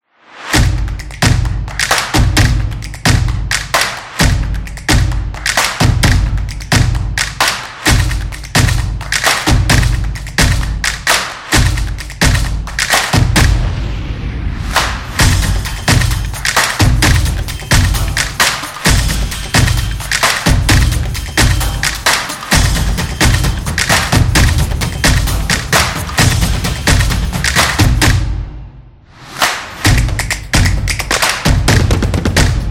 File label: Stomp Drum